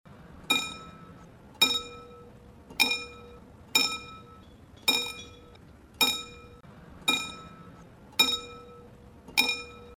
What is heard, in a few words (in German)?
Klingeln Andreaskreuz-Blinklicht, Schranke